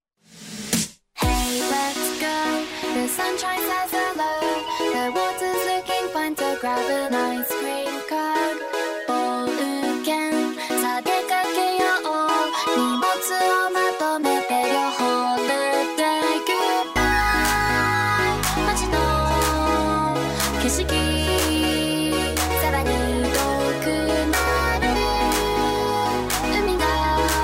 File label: bubblegum bass